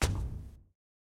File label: footstep heavy stone; Ben 10 - Omnicode